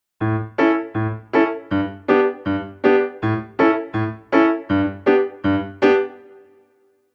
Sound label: reggae